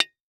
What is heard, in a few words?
Impact Concrete Hit By Solid Metal Bar